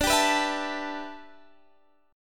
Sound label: E Minor 11th D